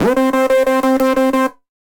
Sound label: Bass Arp, Synth Rhythmic; Prodigal Bass Arp; Ableton; Factory Packs; Synth Essentials